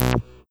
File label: BASS Short Electro Bass; Polyend; Legowelt